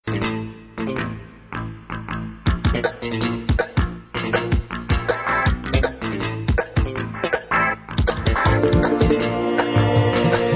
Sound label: Indian Hip-hop Beat